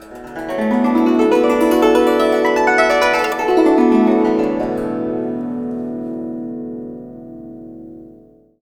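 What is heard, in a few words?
guzheng; Spectrasonics; Heart of Asia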